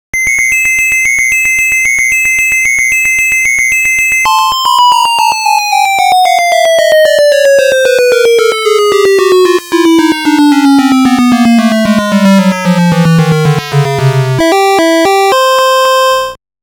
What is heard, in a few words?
Rocket Landing; Where's Waldo; NES